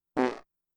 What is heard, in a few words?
sudden fart in meeting